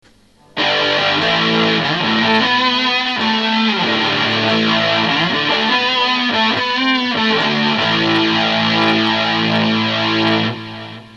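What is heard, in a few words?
distortion; Overdrive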